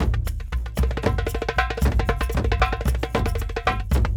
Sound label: Kendong, World Percussion; Brekete, Shaker, Dumbek